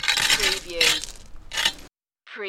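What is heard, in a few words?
Metal Scrape Rock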